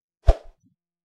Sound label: Swish